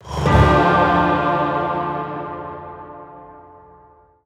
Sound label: Correct Move